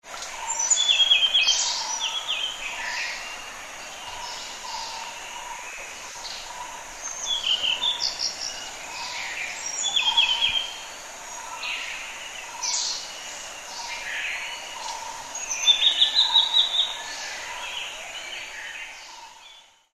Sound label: Blue throated Flycatcher; Sri Lanka